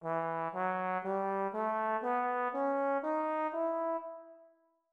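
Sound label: melodic minor E3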